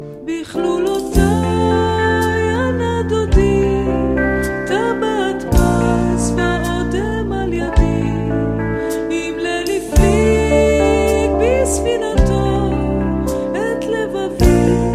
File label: heart beat